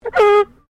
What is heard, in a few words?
BIRD DRAKE UNKNOWN HONK; Birds from Collingwood Children's farm; Animals